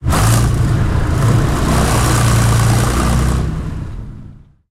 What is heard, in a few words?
su 100 engine load, tanks; War Thunder